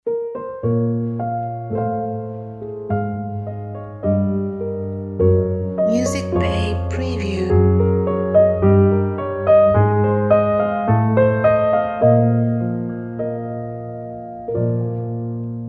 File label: Chromatic Twist